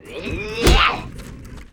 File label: war attack; Mob